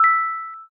news title

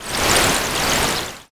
bath soak

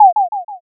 m message

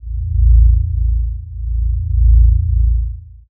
drone

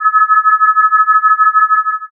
forcefield

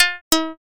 fishing bite

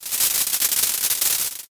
ovation

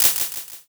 clap

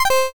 connect fail

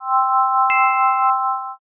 save point

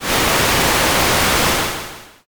fire

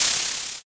curtain

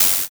paper tear

